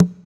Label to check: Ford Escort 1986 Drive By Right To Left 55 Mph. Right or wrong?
Wrong